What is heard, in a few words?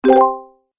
Classic Bonus Points, video game sound effects; Twistedtracks